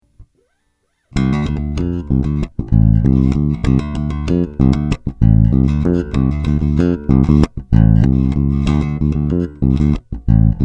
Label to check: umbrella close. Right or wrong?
Wrong